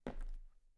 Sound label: Step on wood